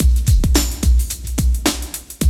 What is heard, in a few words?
loop, Roland; Sound & Vision - Gigapack I